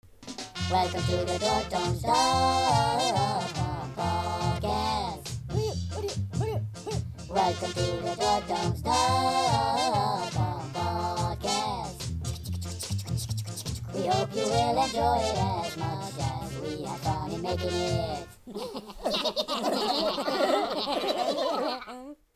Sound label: ska; dorkradio; intros